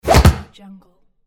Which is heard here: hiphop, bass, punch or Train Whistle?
punch